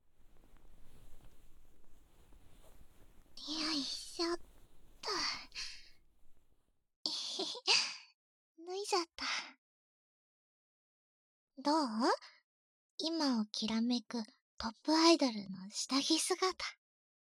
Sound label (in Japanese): 耳舐め, ASMR